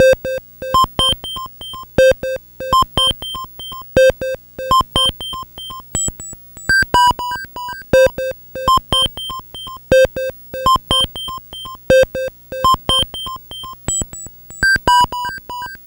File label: Atari 2600 Synth FX; 8-Bit; Chiptune